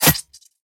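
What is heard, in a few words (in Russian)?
поражение цели острым лезвием мачете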